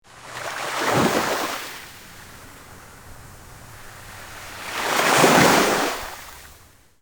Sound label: ocean waves crashing